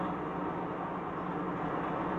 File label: Boulet Engine loop far